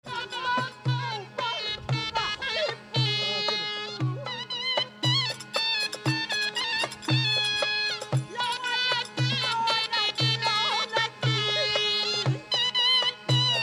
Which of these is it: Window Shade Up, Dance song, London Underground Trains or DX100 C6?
Dance song